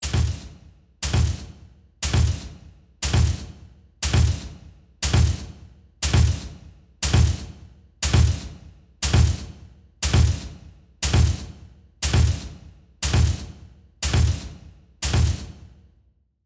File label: Steampunk Machine, Scifi, Steampunk, Machine, Bipod, Robot, Walk, Footsteps, Pass, By; Science, Fiction; BlastWave